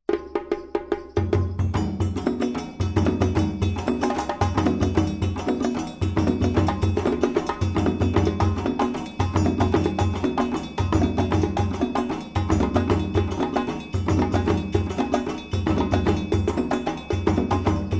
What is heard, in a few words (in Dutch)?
Soli Rapide Volledig, djembe